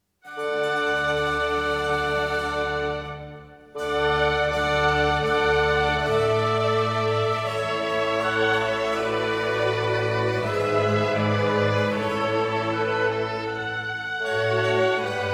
Concerto for trumpet, 2 oboes, strings & continuo in D major; Grave; TWV 53: D2; Music for Trumpet